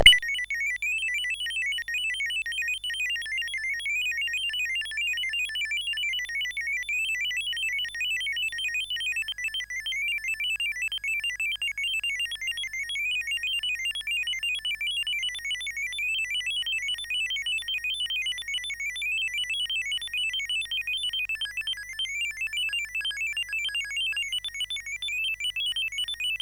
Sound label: Boing, CSG8580R5